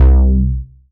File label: BASS MONO